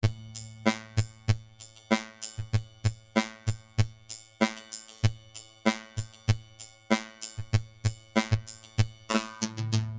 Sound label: RnB Beat robot